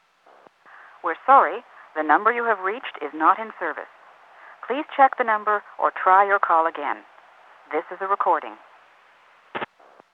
Telephone

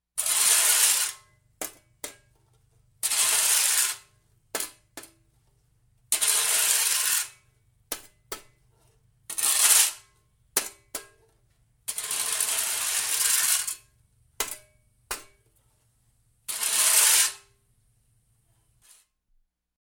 Shoveling Dirt On Concrete

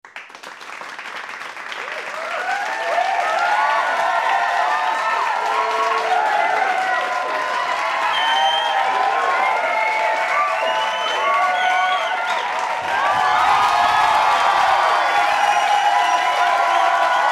Crowd Applause and Cheer

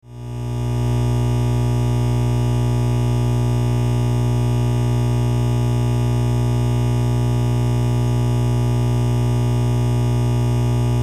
Electrical Noise

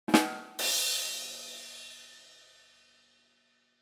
Rimshot kdum keesh

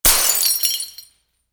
Smash Glass

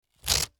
Adding Machine

